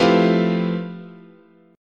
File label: F Major Sharp 11th, chord